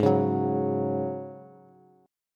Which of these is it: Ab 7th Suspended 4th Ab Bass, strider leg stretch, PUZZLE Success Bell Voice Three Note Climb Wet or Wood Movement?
Ab 7th Suspended 4th Ab Bass